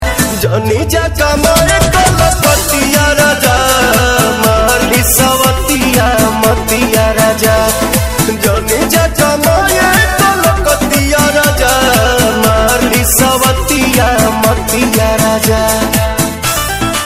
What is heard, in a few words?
DJ bhojpuri song